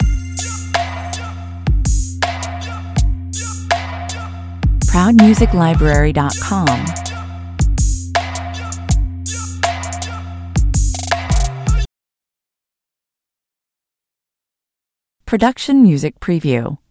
trap this (loop)

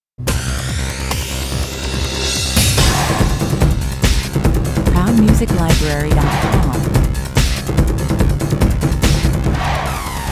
end game 144 bpm

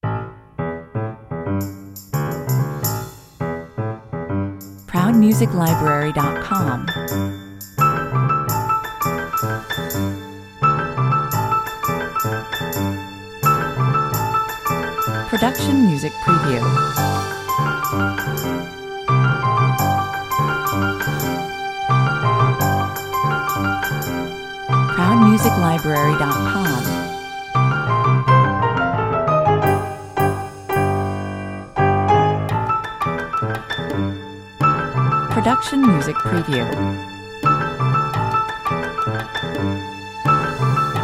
contemporary piano

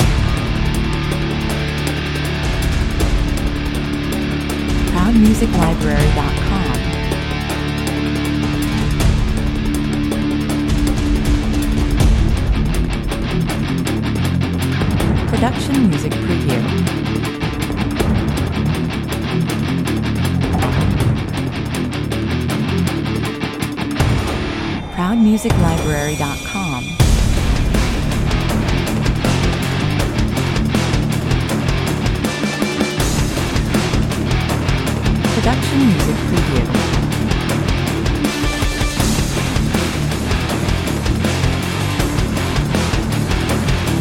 mostly dark 160 bpm

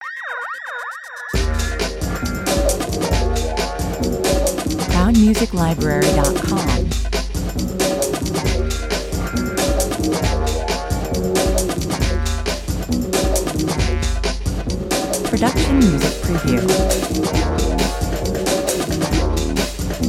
mean streets 135 bpm

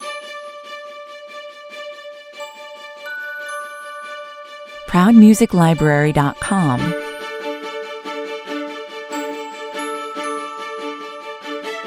orchestra only

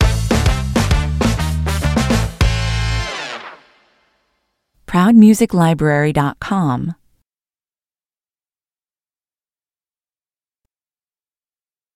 the funk stinger

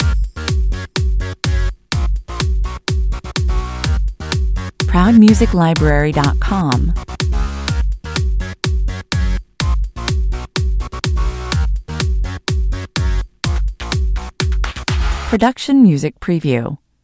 pop loop

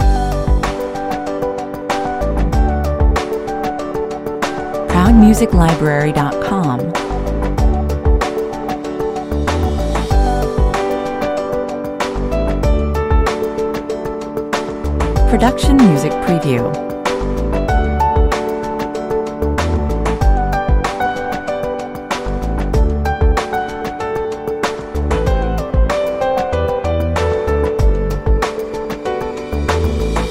friendly corporate theme loop

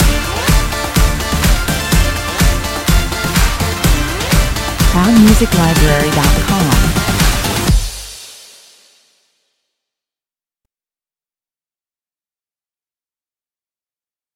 house party stinger

